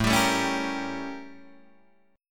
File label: A 7th Flat 9th Guitar Open D